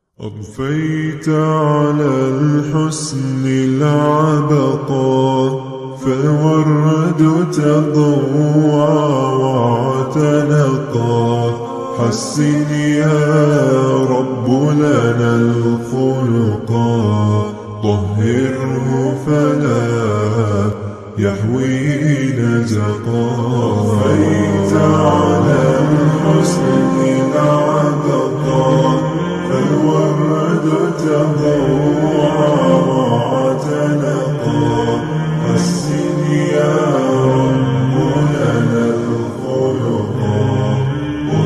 нашид slowed reverb

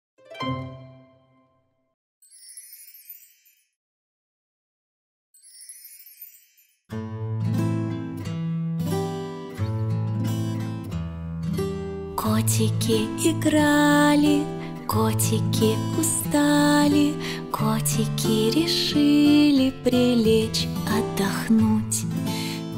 колыбельные для малышей; цветняшки

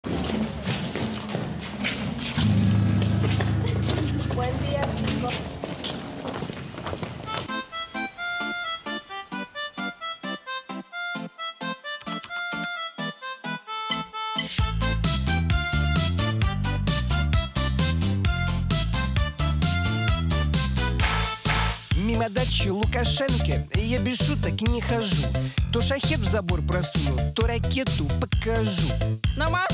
политические частушки; Мизулина; Путин

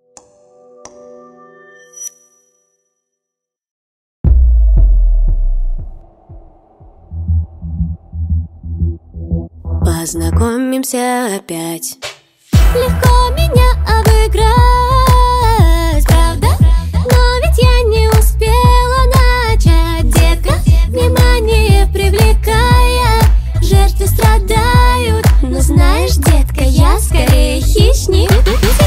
vocaloid на русском

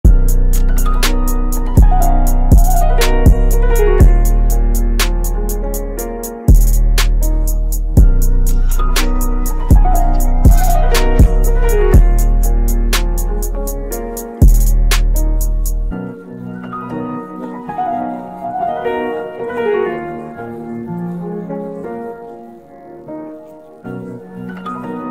минус instrumental караоке

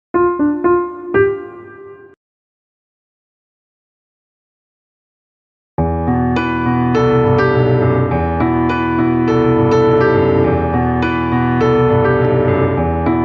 караоке piano karaoke; отель хазбин на русском; песня аластора

